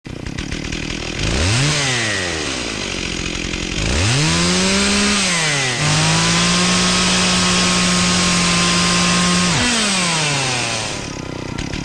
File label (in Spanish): benzopila